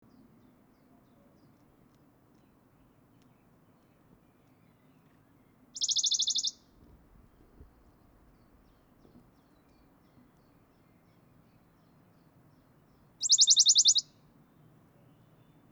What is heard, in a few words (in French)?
pouillot de bonelli, chant; fernand.deroussen